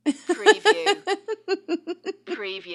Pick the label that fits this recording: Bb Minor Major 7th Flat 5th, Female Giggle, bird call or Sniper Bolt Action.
Female Giggle